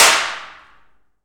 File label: PRC ALMWA, Aluminum Wall; Rhythm Section; FX Perc